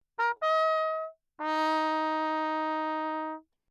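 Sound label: trumpet, without mute, signal; Perinétventiltrompete; Lechner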